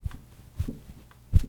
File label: Bed Sound